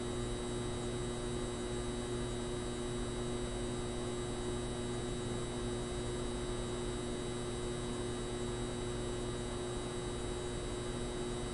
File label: light hum